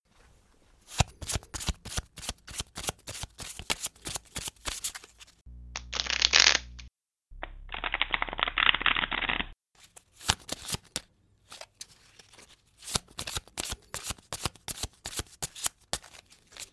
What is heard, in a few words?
Card shuffling